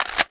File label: famas magin; weapons